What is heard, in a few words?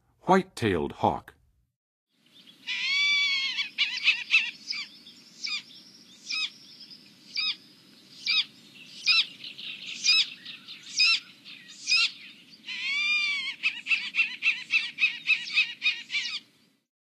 White-tailed Hawk, Birds of North America; Western USA; Western USA Songbirds